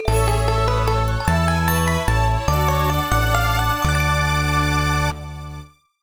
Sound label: Fanfare Long; Elebits: The Adventures of Kai & Zero; Nintendo DS